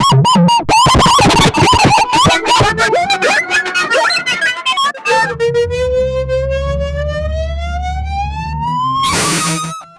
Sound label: armpit farts